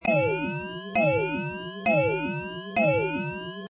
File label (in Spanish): alarma ting